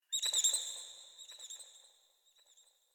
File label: Ext Texture Bird Small; Ambience; Misc Outdoor; Warframe; Infested Eris